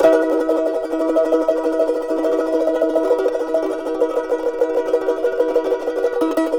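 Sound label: charango F minor tremolo; Spectrasonics; Hans Zimmer Guitars VOL-2; CHARANGO CHD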